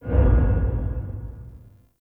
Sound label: Low Mech; Ambience; Warframe; Nokko Colony